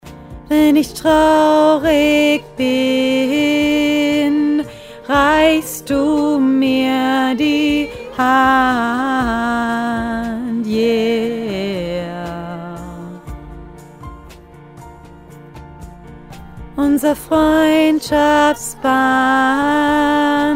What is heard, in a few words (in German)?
Bester Freund Mittelstimme